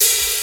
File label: raw open hi hat one shot g sharp key; open hat samples